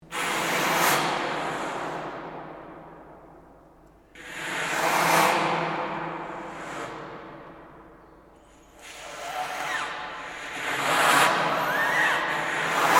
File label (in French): seq souffle